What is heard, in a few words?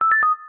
error fatal; Business skieme Beta 1